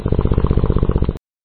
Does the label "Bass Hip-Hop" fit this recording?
No